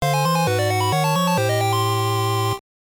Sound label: Unknown Jingle; Wizardry I Proving Grounds of the Mad Overlord; GBC